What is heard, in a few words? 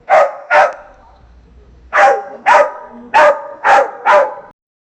bustling people talking